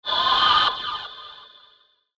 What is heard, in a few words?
Crescendo